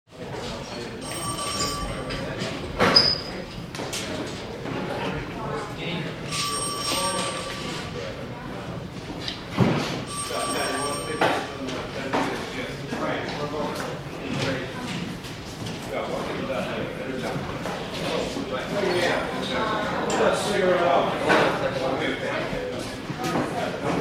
Police Station Busy Activity; Interior Crowds

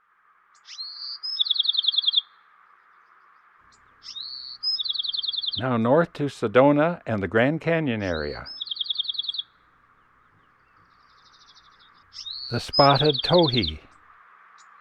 Spotted Towhee; Western North America; Dan Gibson; Wildlife Identification By Sound

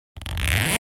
Zipper; Household

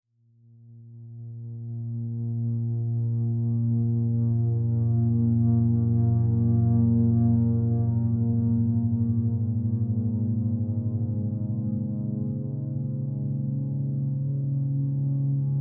FM Melodic; Beds

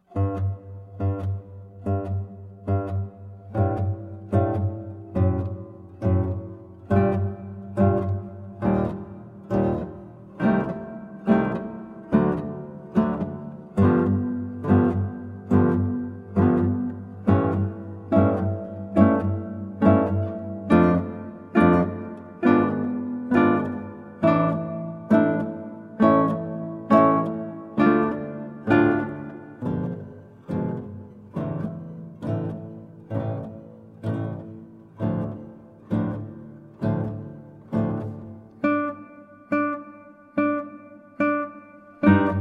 Adagio e spiccato, 8 String Guitar; Baroque Concertos; Concerto Op3 no2 RV578; A Vivaldi

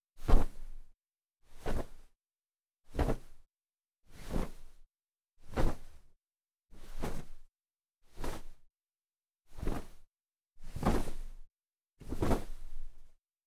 Coat Flap Cloth Shakes Thumps Movement; Foley - Props; Foley Essentials

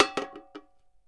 CAN HIT; 1000fx

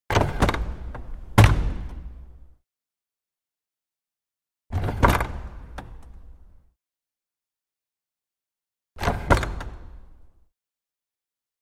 Small Wooden Pulpit-Choirstall; Hollywood Edge Historical Series; cd4 Castles Palaces & Monasteries

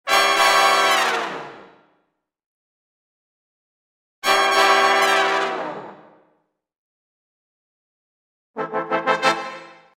Big jazz band hits; Music effect; Hollywood Edge Sound Designers Tool Kit

